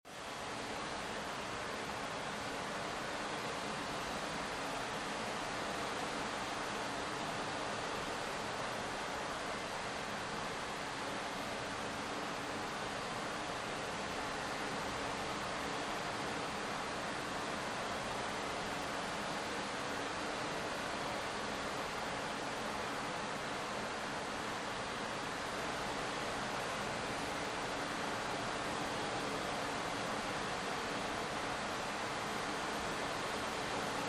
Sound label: Rain Heavy